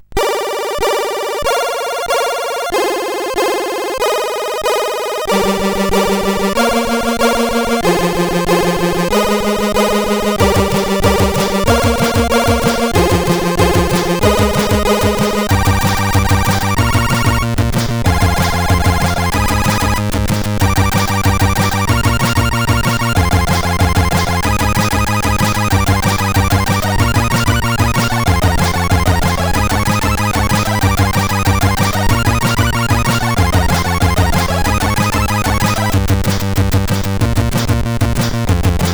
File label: Thunder loader, sid CSG8580R5